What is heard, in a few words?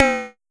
Pollard Perc, Syndrum